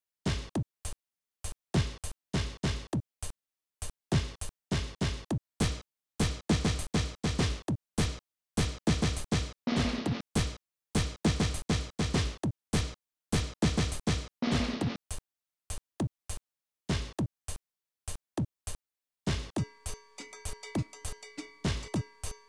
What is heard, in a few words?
drums only